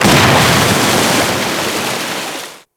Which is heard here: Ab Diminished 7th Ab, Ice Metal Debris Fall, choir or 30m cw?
Ice Metal Debris Fall